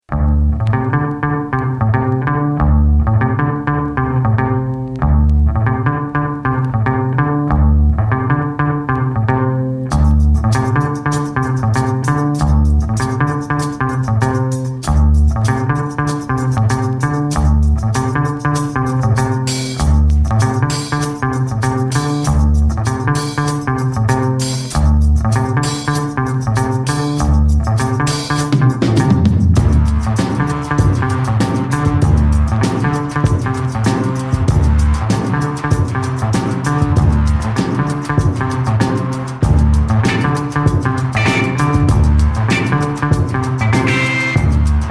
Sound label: Bass